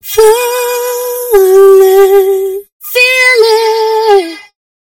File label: Stereo Flanger Female Vocals Wet Psychoacoustic Tube; Sonicircuit; Syntheway